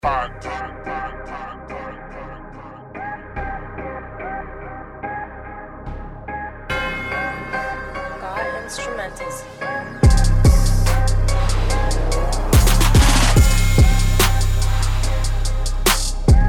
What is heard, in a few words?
Instrumental, Hiphop; Hip Hop TXL Vol 41